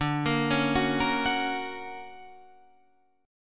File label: D Augmented Major 7th down Guitar Drop D